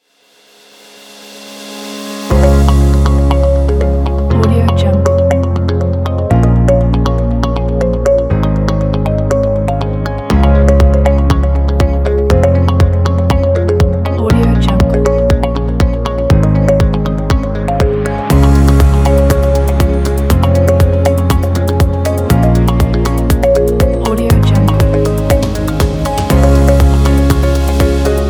lively hopeful

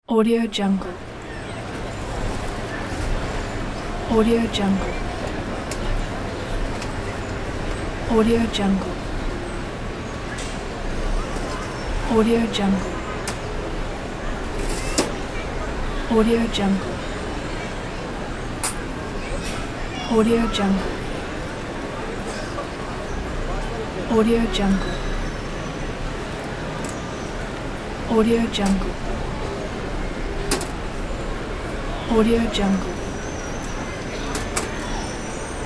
city ambience

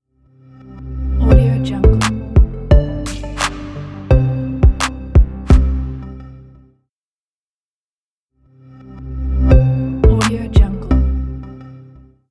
modern intro tech logo

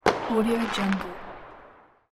small firework explosion and sparkle

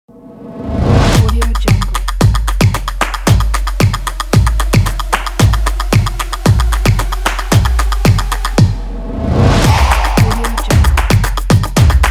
stomps riser

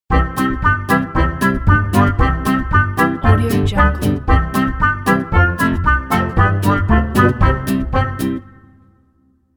balkan yankee doodle logo

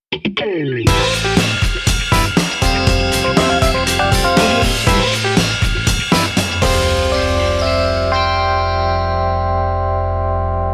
snazzy groove logo

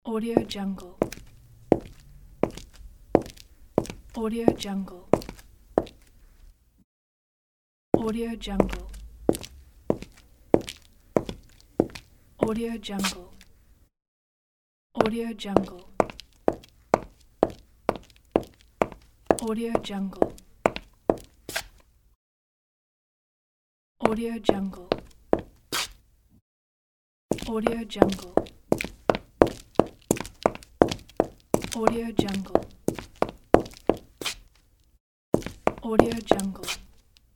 foot steps

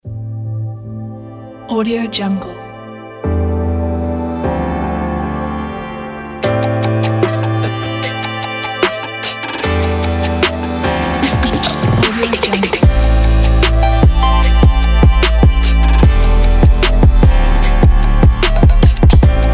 fashion chill rb

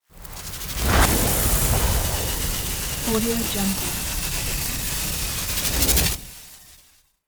electricity plasma ray medium; smartsoundfx

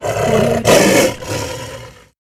lion

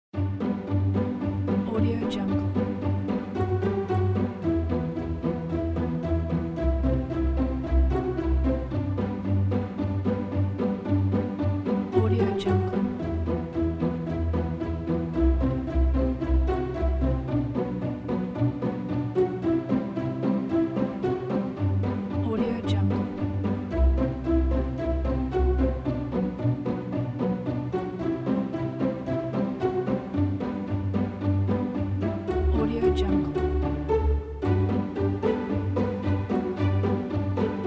uplifting strings

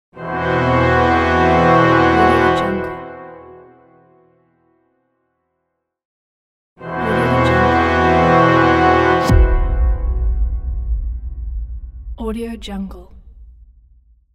lost battle

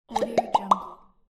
swooshy bubble pop

